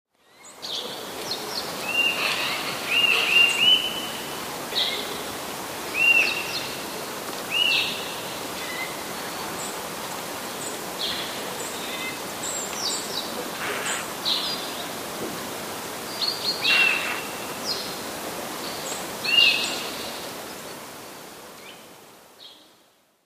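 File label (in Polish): kowalik nawoływania, Są to już mocne, głośne, prawie niemodulowane gwizdy o różnych wysokościach; nagranie nawoływań kowalika z 14 grudnia 2014 roku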